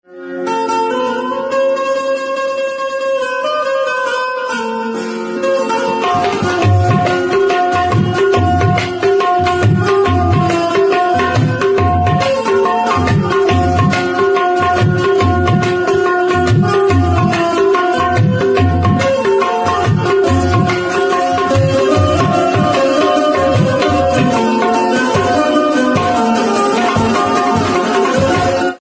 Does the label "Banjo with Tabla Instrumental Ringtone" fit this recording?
Yes